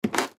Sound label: Floor Creak, Floor Impact Creak; Creaking